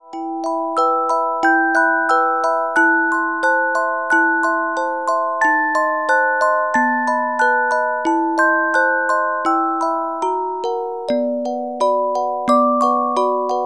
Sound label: Carillon Music Box Melodies